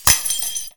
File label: GLASS